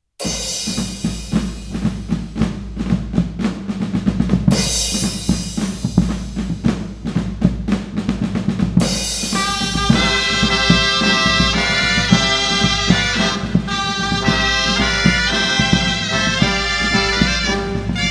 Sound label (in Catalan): ball trabucaire; historia